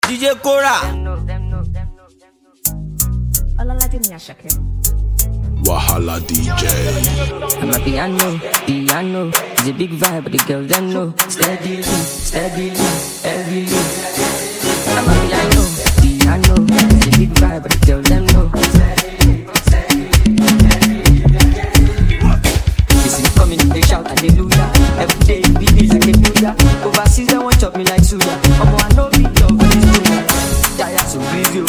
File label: Amapiano Special Version